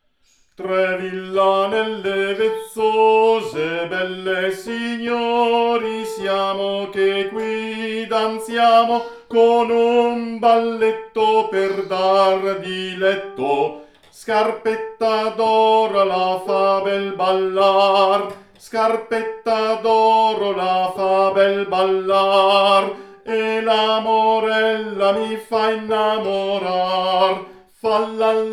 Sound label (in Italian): BALLETTO DI VILLANELLE contralto